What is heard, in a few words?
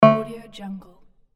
warning